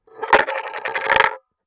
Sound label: Coin On Collander; Coins